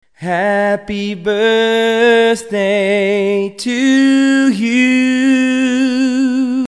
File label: Note Bend in a Song